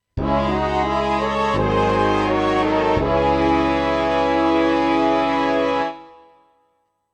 SC880; Dune 2

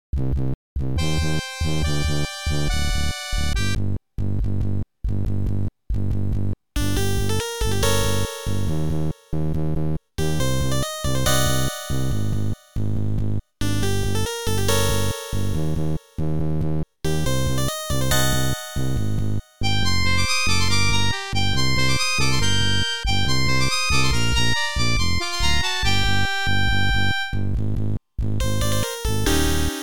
OPL3; ultima underworld ii labyrinth of worlds

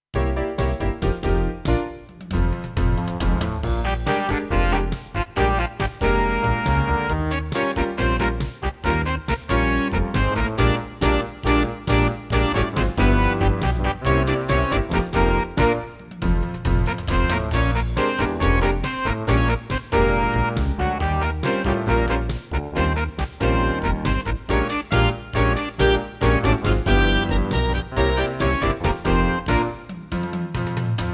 CM500 GS; LSL3

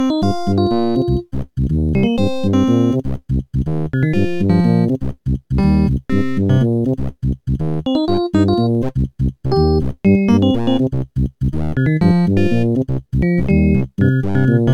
OPL3

